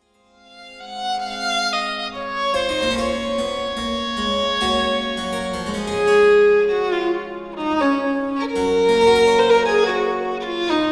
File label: Sarabanda